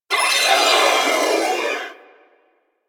Sentient Death, Barks; Enemies; Symbilyst

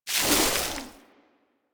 Hoverboard Terrain Caster Snow; Warframe; DE; Vehicles; Venus

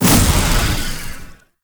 Survival Orb Destroy; Warframe; Zariman

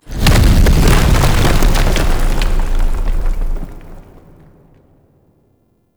Boulder Crash, traps; Objects; Champion Crypt

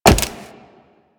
Wall Jump Default; Footsteps; Warframe; Tenno; Wall Run

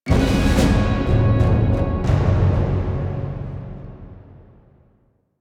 Notification Cue; Stingers; Boon

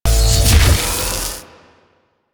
Turret Chain Lightning Open; Warframe; Zariman

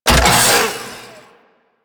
Saw Blade Launcher Mech; Warframe

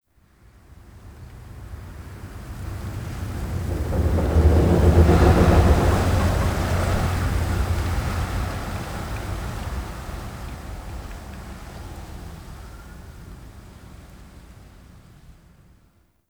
Root Cave Skylight Large Wind Gust; Ambience; Props; Neathuns Root Cave

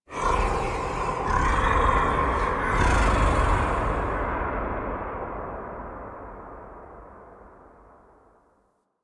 Sky Breaths; Ambience; Duviri; Warframe

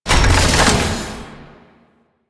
Customization UI Open; Veilbreaker; Kahl

